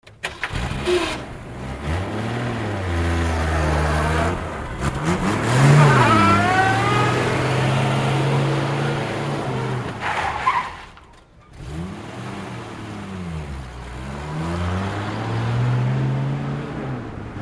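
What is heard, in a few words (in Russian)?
zvuki startera avtomobilia, car starter sounds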